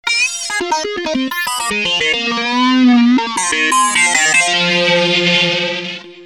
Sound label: Kawai s5000